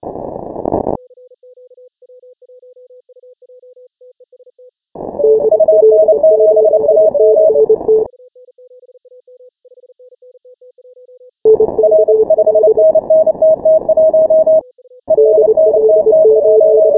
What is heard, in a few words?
MorseRunner